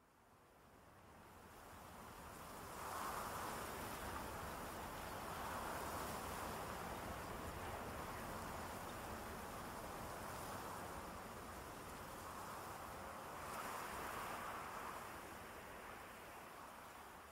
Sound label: tree wind; matmos hl